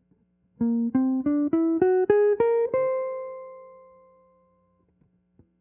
scale Bb wholetone

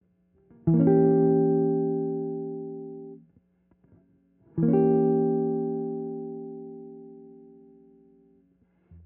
chord quality Edim

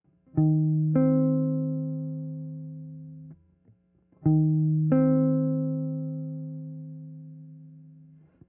Intervals M6

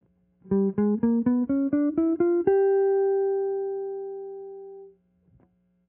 scale G dim half whole

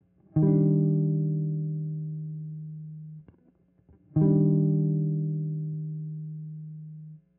chord quality Eb∆

